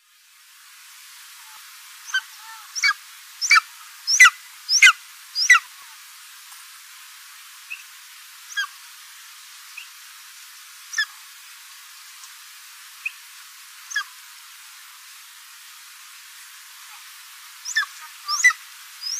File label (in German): Steinadlerruf